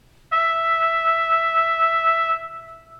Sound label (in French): instrument Mi trompette; chapitre 08 Signal sonore frequence niveau sonore